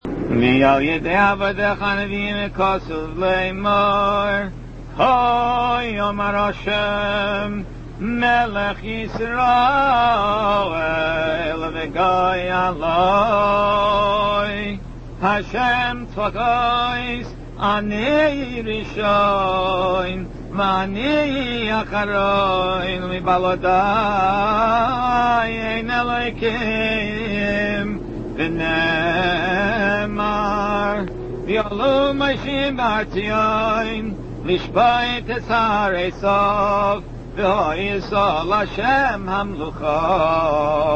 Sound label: Vial Yidei Avadecha Haniviim, nusach